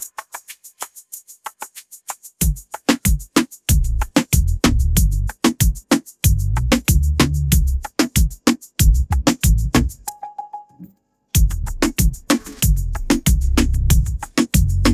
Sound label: latin reggaeton beat; ai